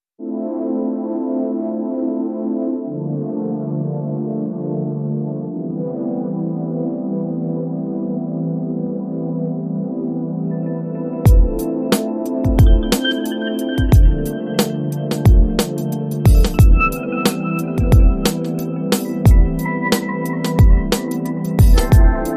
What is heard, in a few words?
Swing